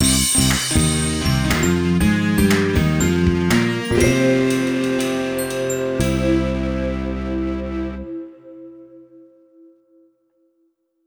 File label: Knife Fight Victory; Night in the Woods; At the End of Everything